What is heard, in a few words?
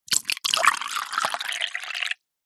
drink water pour into small plastic cup